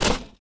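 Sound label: footstep Dirt; droids robots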